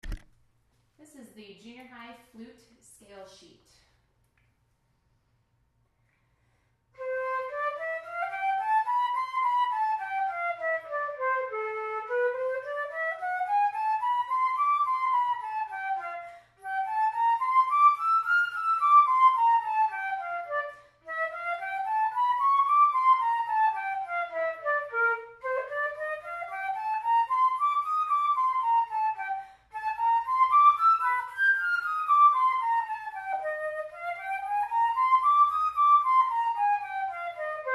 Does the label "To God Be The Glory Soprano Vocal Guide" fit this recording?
No